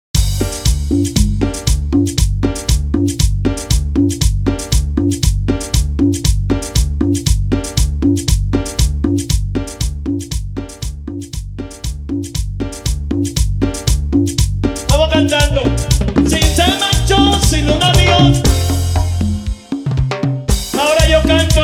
Intro Acapela En Vivo, Bpm 118

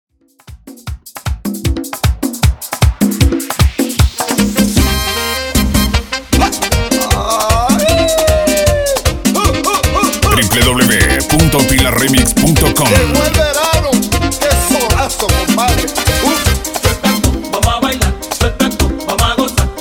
Extended Merengue, Bpm 154

